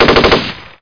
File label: M249 auto; SpecOps2 MPlayer Demo